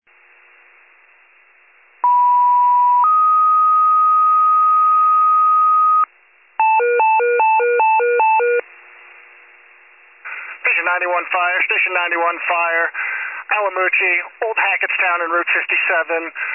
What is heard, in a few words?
FIRE pager alert